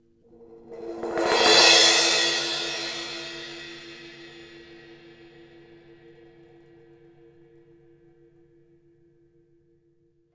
MALLETS, CRASHES; Sampleheads; New York City Drumworks VOL-1; KD CRASHES

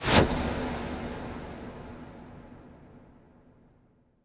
IMP WIPE, Cinematic Impact

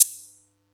Yamaha EX-5

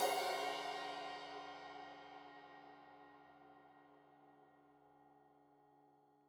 Crash 15in L edge, Cymbals Stereo

